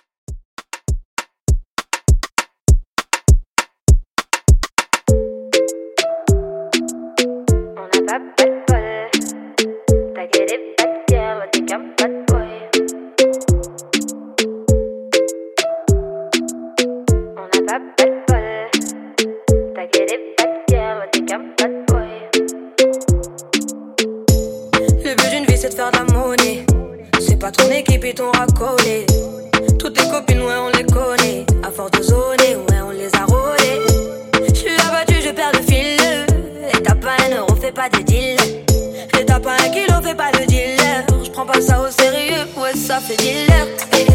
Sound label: Party Moombah 100Bpm